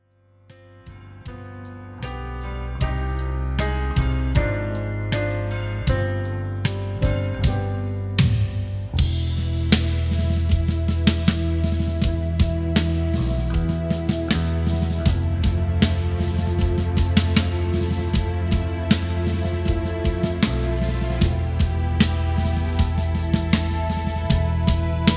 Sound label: backing track, guitar